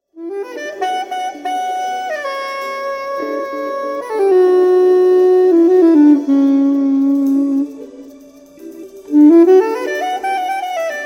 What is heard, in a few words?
Sop Sax